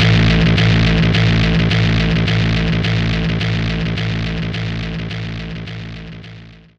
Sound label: slip gtr bass; pitchshifter PSI